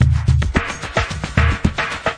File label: thick echoes, 110 bpm, drum loop; 101 to 110 bpm